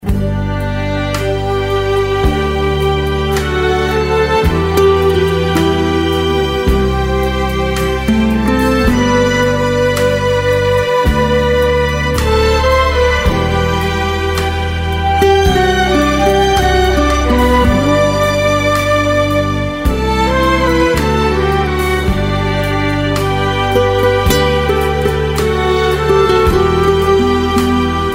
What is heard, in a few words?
Instrumental Soft Rock